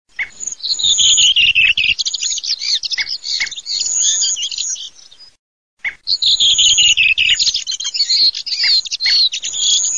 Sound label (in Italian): SASSELLO